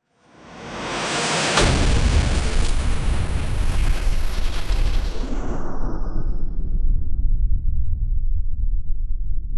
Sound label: explosion; neural network